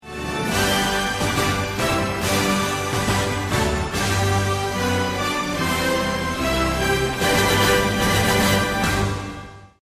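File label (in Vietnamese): Nhạc nền mở đầu game show; rung chuong vang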